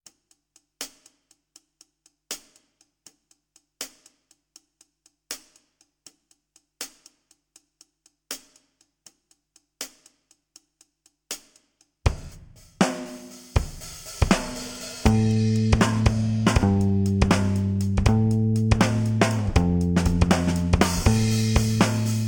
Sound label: Rock, Guitar; Guitar Techniques 2019 Issue 294 Spring